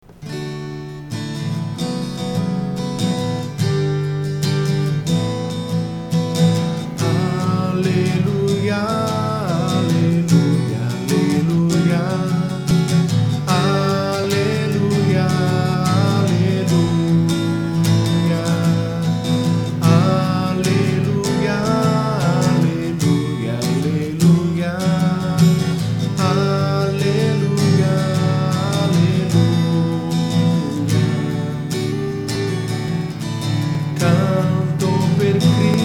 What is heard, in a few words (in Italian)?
Alleluia canto per Cristo